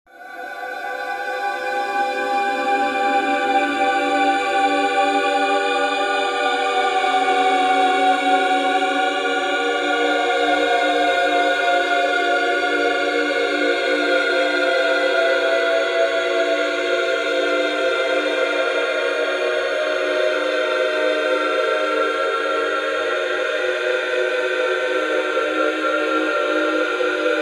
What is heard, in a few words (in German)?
voegel teil4 gestreckt